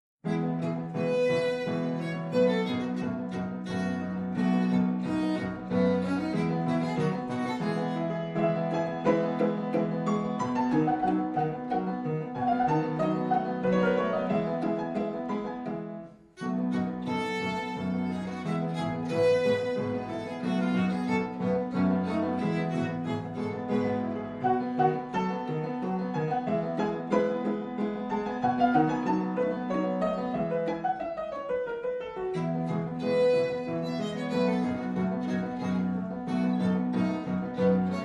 Sonata II in F major for fortepiano and violin Rondo